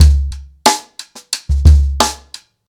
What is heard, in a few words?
121 bpm breakbeat sample c sharp key